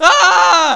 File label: scream